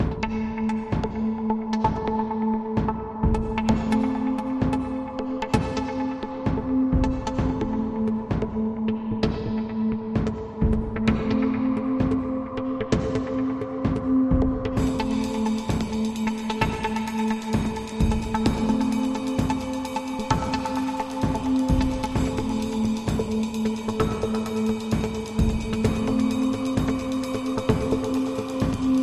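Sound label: Drone, Light With Rhythm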